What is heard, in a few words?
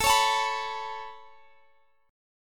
A Major Add 9th A Guitar Standard, chord; Aadd9 strummed; JGuitar